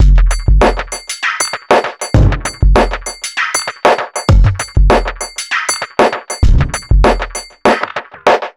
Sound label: Abstract Electrofunkbreakbeats 098bpm; Lambruscowillneverloop